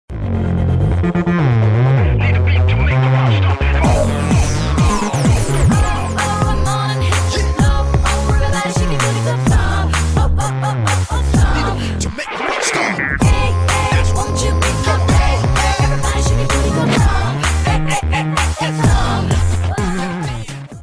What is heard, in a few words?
sony ericsson ringtone